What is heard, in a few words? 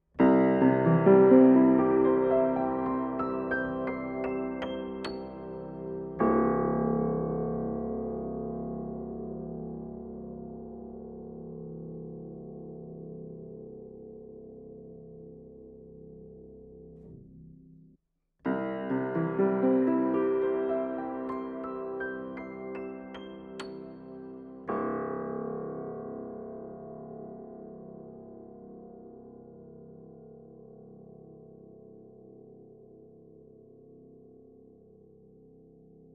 Piano, Mics Inside, Closed Lid and Open lid; Audio Technica 803